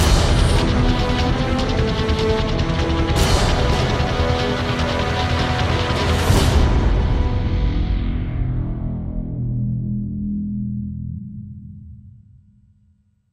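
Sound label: Sting Bridge Shield Down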